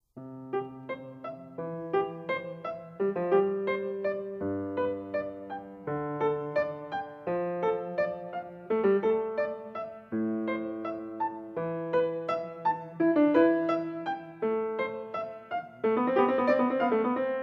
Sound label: Prelude for keyboard in C major; Bach, Johann Sebastian; BWV 924